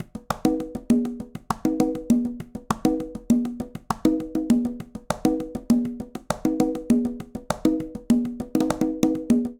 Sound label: Cumbia Conga Tumba-100bpm; Latin Percussion; Ableton; Factory Packs